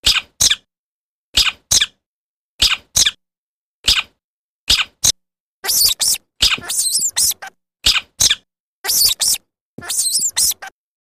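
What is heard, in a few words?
Single Squeaks Close, bats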